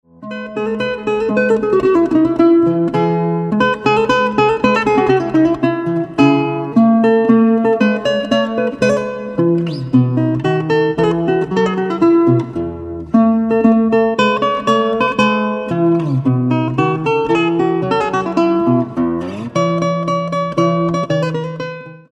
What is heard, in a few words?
MINUET